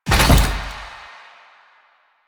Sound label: Collision Fast, Motorcycle; Motorcycle Ninety Nine